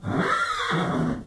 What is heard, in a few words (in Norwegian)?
hest